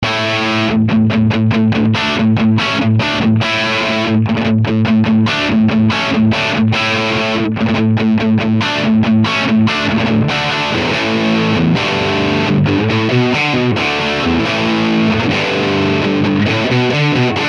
Green Beret 10 - Dirty 1 - Matamp GT2 - PRS Cus24

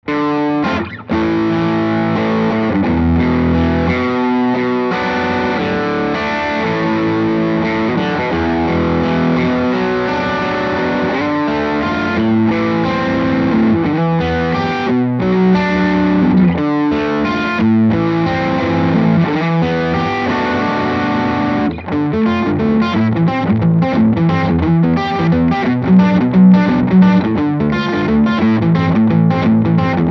G12A drive

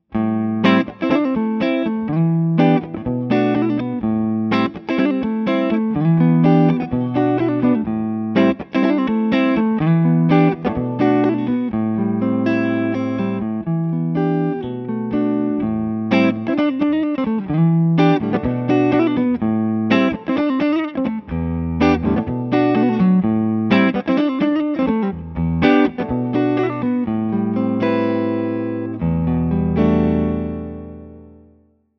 Blackhawk HP clean

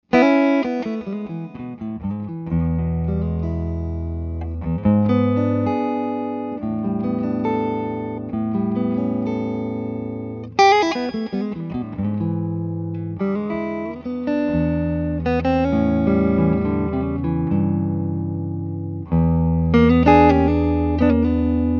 Blackhawk clean

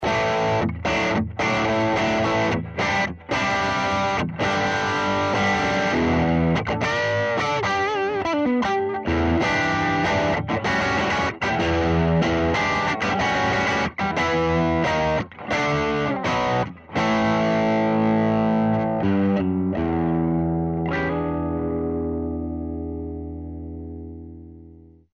dirty

